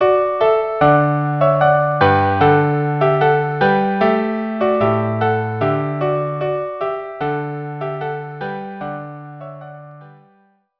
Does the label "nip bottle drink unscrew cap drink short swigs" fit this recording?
No